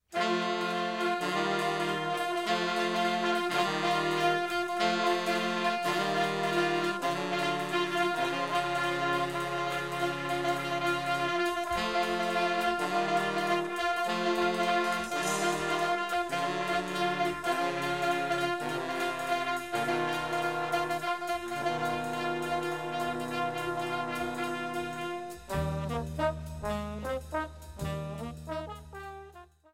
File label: Kicks and Sticks